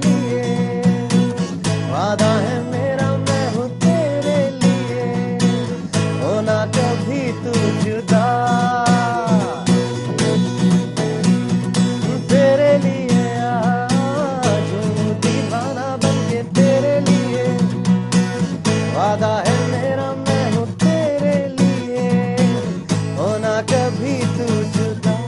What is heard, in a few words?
guitar ringtones